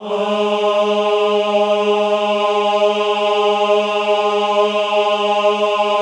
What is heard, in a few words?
AHH G2, choir; Best Service; Extended Classical Choir; AHH MIX